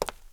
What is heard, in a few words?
FOOTSTEP CONCRETE; SIMUZENSOUND